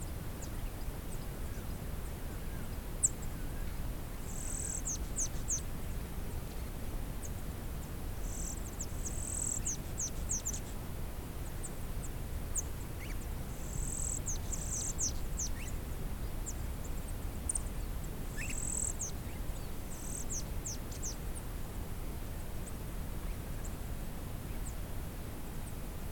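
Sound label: Bolivia Uyuni Desert Ambience Exterior; Pachamedias; Bolivia Desert Vol.1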